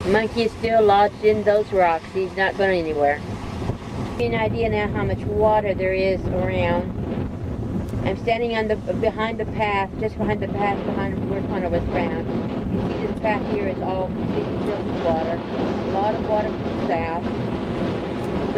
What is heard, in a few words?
water